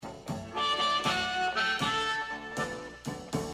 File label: Fake Octave Diato, harmonica